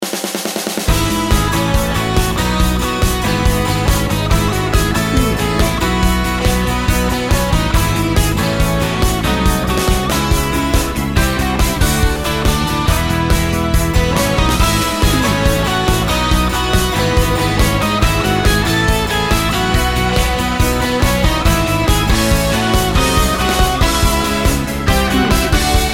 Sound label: showtime rock